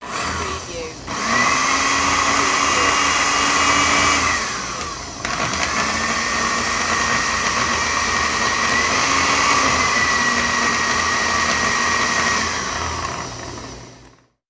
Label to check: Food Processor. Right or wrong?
Right